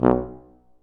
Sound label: C TUBA, stacc; Roland L-CDX-03 Disk 2; BRS Tuba